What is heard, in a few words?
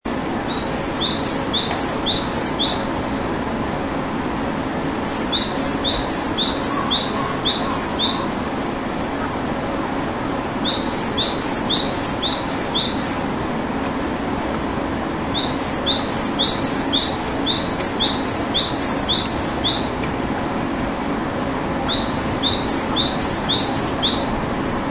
Bird Song